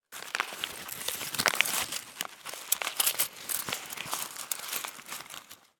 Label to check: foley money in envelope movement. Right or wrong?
Right